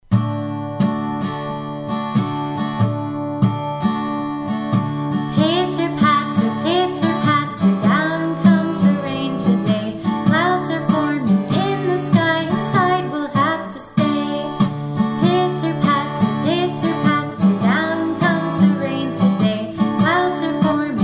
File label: rainy day